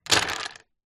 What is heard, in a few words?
leisure jenga tower fall over wood